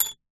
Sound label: staples block set down on table